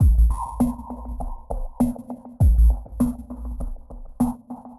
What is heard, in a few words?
Ghost Loop 100BPM, drum loop; Ghost Layers; layering